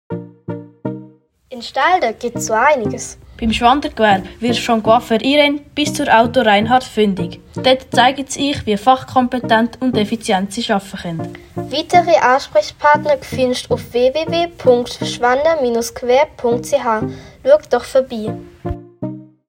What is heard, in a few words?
Jingle; Auto Reinhard; Coiffeur Irene